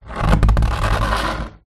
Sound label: plastic washing basket tub drag on wooden floor; foley